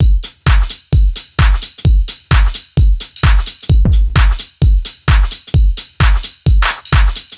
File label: Solid Loop 130, Loungin House; Equipped Music